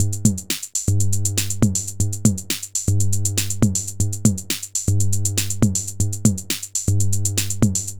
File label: drums 50hz, ringmod